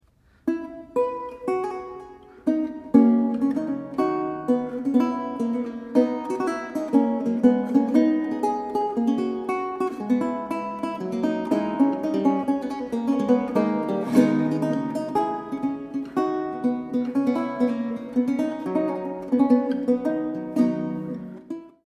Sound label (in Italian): Gigua; Roncalli